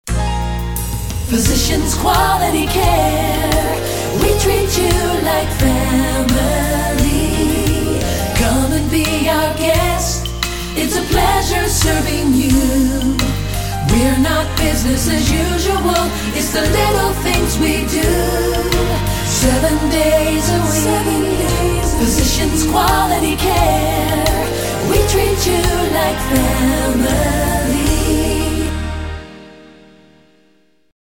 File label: jingle